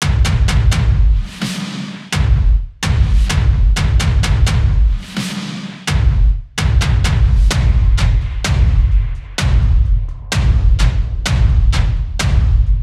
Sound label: DRUM LOOPS